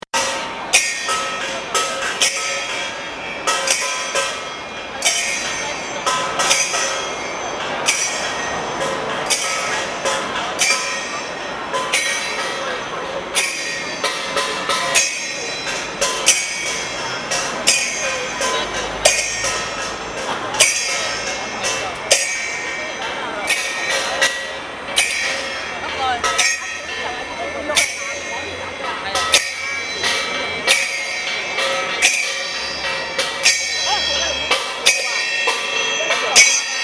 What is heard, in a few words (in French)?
Cymbale Manif